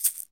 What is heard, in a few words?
shaker, percussion